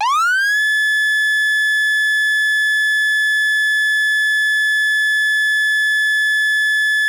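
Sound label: Federal Signal PA300 Hi Lo, Siren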